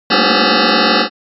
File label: cluster open third